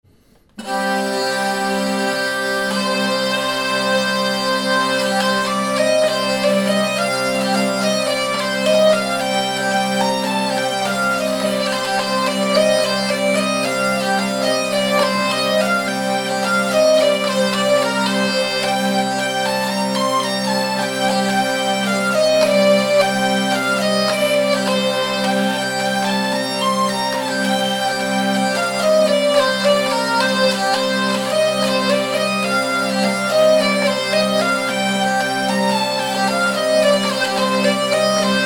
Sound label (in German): Klangholz Branle Ecosse; Arbeau; Tanzmusik